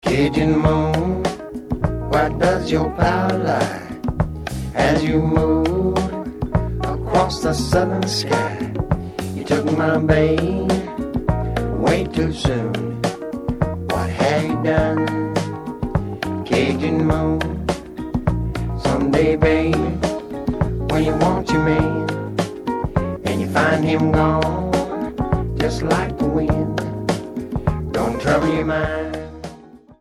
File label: cajun